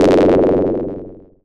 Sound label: Cry